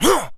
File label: jump, Human Male; zps; HumanMale-01